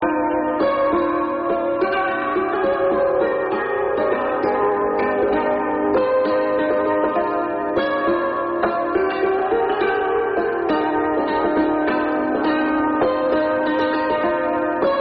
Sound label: Waltz; Barnes Peeka Boo Waltz